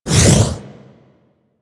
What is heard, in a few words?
Flame Laser Stop; Warframe; Corpus; Corpus Railjack; Spec Ops Fire Moa